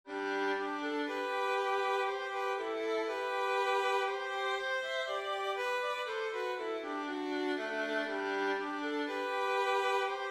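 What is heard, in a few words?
melody in G with violin 5ths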